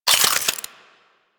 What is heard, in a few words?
Modular Secondary Handle Slow Reload, Guns